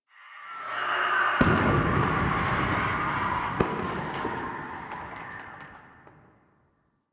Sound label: Ambient Distant Explosions; Infested; Warframe; NineteenNinetyNine